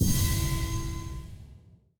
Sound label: Dream Essence Corpse Collect